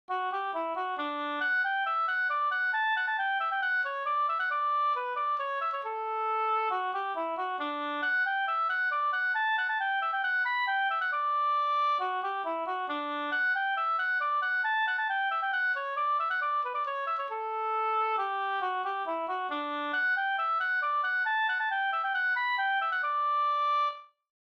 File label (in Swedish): polska springdans; fr Amot Osterdalen